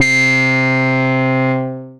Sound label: Steel Piano; Bass; Synth Lead; Ableton; Creative Extensions